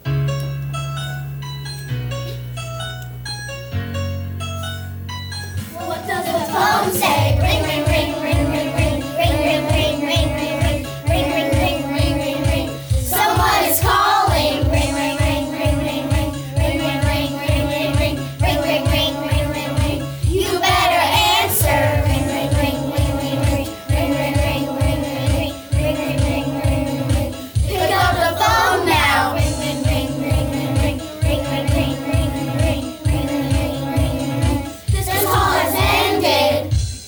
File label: ringtone